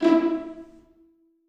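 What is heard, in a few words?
1st violins pizzicato e4; Sonatina Symphonic Orchestra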